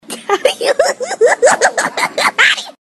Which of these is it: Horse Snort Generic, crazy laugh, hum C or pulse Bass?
crazy laugh